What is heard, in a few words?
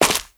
Steps; car simulator 2